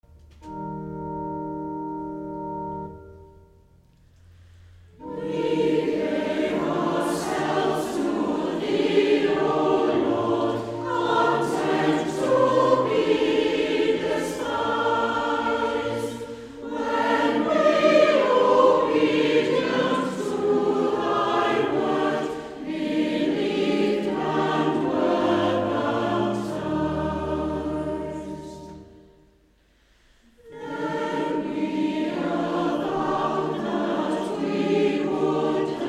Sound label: Choir, hymns